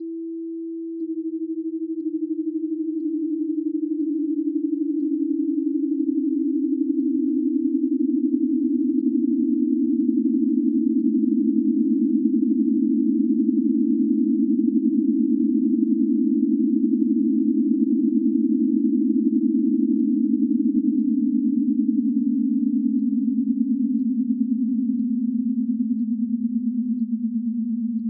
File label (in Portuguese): acorde